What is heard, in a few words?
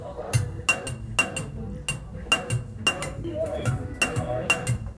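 seamless loop of a singap, cafe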